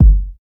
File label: tight steel kick drum g key, Royality free kick drum sound tuned to the G note.